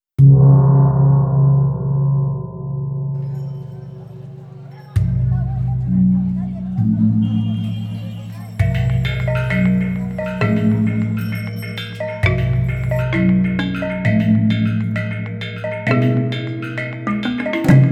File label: Indonesia Gamelan